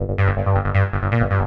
rubber, bass, 160bpm

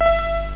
ting

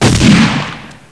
gun fire; Ghost in the Shell

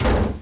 BUTTON; Laser

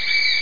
bat die; Giza

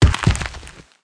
lion fall

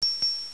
DING

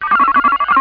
machine; billyboy

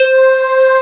wally bell; The Epic Collection 3; Epic Marketing; muzak samples

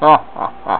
Evil laugh; Tarot-Matic 2.0

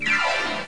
Disco - Beep; discotheme

